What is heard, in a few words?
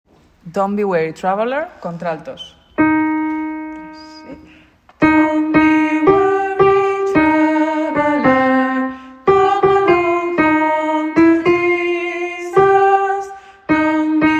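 alto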